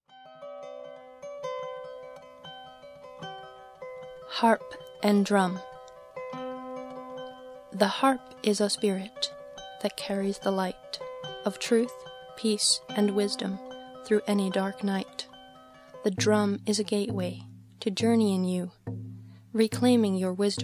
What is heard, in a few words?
harp and drum